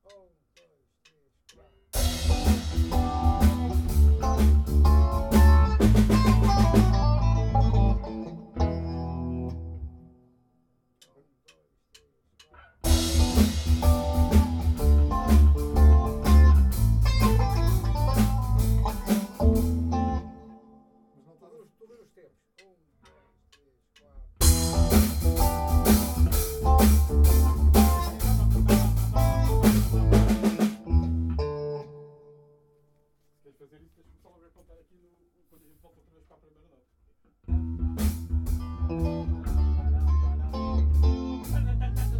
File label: Blues finals